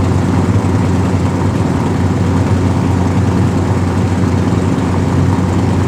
Aircrafts Engines Packard Merlin Prop Cockpit Ex In; DCS